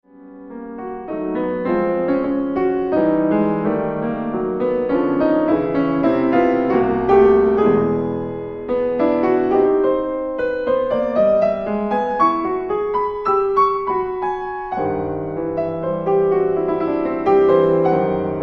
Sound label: Preludes for piano, Andante